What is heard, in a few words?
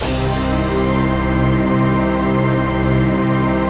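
chord pad, Pads; Best Service; XX Large - Pads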